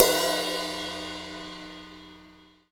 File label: XV Crash, Roland XV-5080